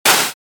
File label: Air compressor machine hissing air release